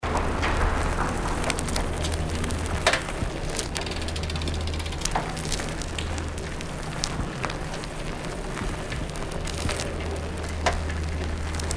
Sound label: bicycle run